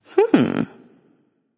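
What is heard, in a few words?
thinking, female; smite; voicepack; hera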